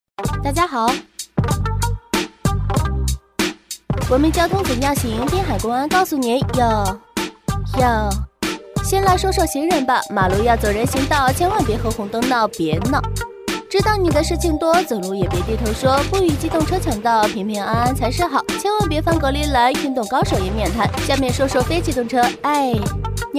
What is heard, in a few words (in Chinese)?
女-说唱Rap交通